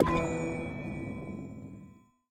spin button click; netent; pyramid

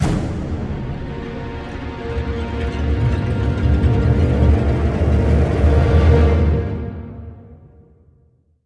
near Win; netent; bloodsuckers

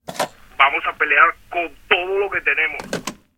answer Phone; netent; narcos

